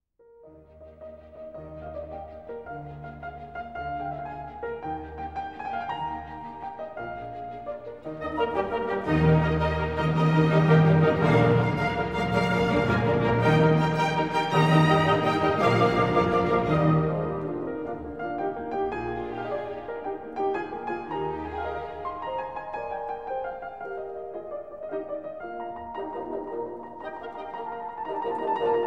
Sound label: Piano C